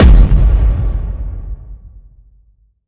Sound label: Smash Hit; Slammer Smash Hit